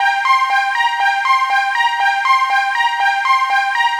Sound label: Pad, Trance